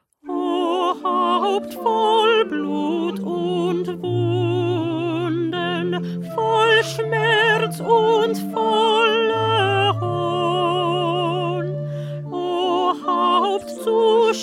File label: Alto; Bach; St Matthew Passion